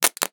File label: substation oneshot; nuke